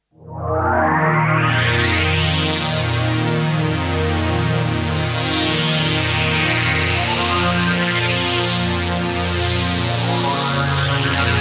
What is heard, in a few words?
Emax Filter